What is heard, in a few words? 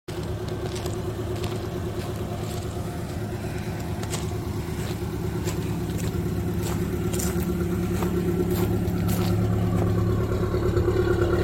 Nissan Exhaust Sound